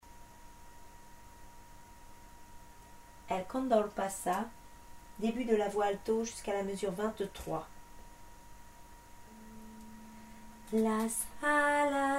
el condor pasa debut alto

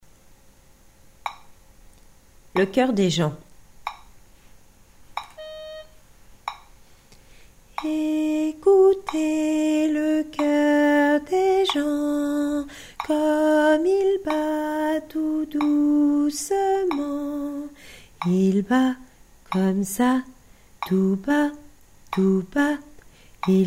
tempo 138 à la noire

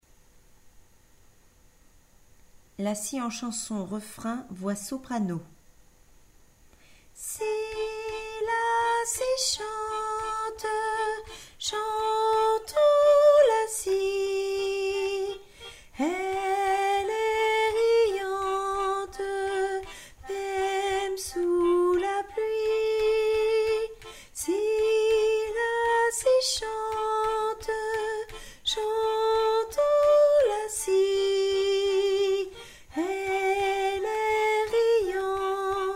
la scie en chansons refrain soprano

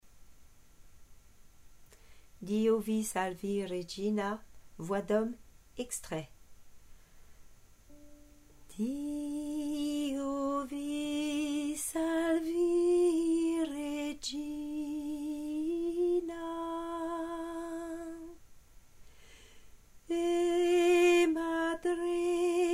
hommes; dio vi salvi regina; extraits

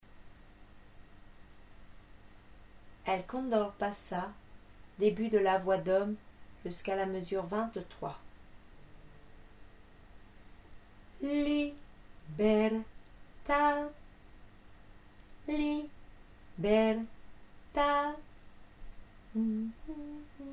debut hommes

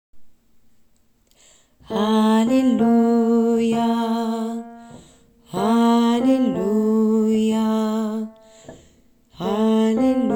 hallelujah refrain alto

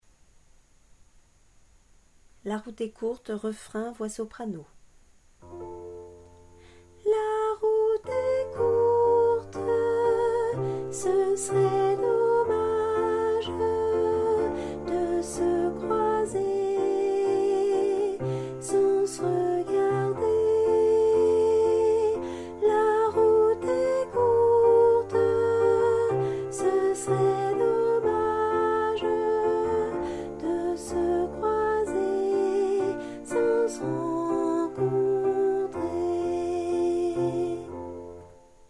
la route est courte refrain soprano

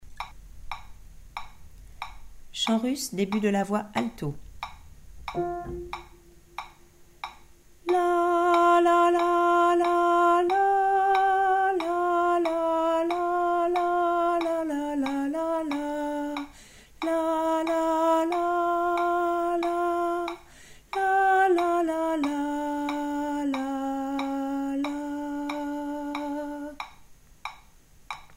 voix alto; debut voix alto

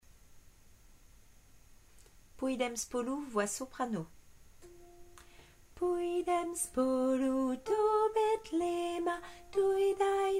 soprano

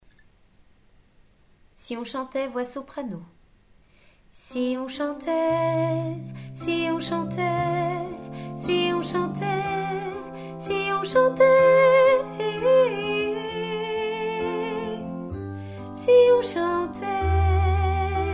soprano

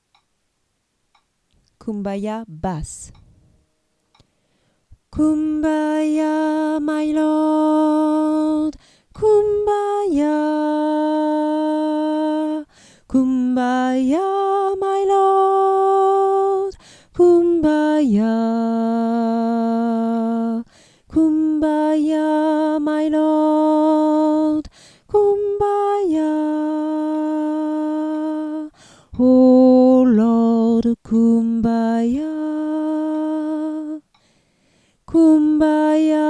basse

